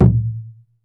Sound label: PUEBLO, American Natives, Earth Tones; E-MU Formula 4000 Series Vol. 4